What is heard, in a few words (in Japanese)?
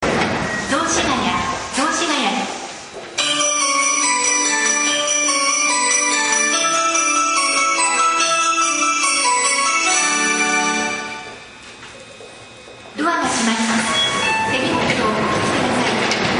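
zoushigaya hassya, departure melody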